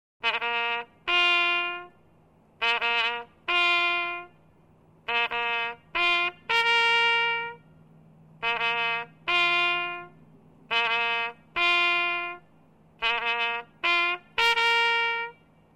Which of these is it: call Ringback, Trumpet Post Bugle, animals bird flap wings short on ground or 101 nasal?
Trumpet Post Bugle